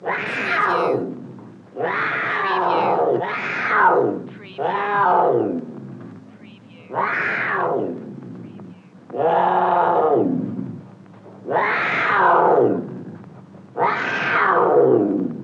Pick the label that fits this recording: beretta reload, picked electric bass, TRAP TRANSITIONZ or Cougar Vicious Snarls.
Cougar Vicious Snarls